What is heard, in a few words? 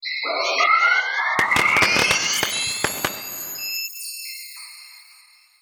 taunt; wisp